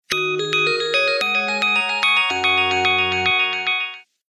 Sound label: Chillout Drop, Ring tones